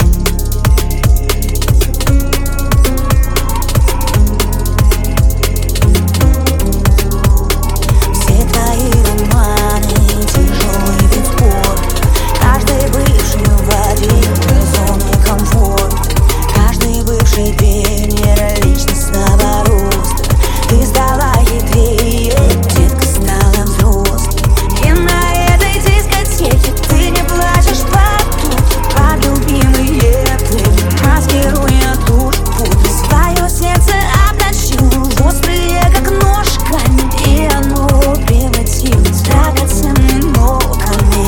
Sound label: drum and bass remix